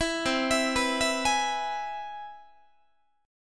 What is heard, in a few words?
C Augmented Major 7th, Guitar; arpeggiated; JGuitar